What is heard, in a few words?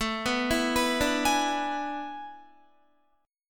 A Major 7th Suspended 2nd Suspended 4th, Guitar; AM7sus2sus4 arpeggiated; 17,14,14,16,14,15; 4E,4A,5D,5G,5C,6F